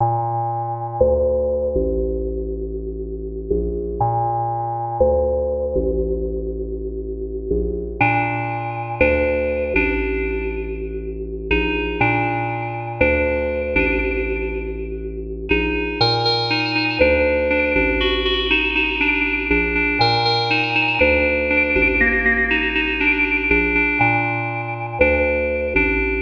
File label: Fish Bowl